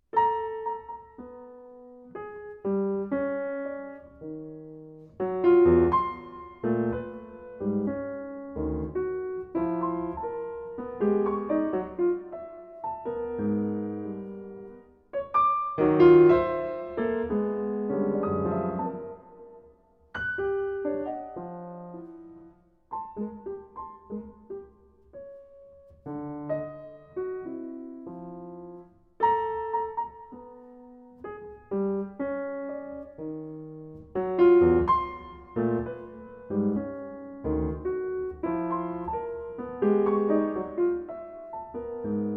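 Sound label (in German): Klavierstück, Im Tempo eines Menuetts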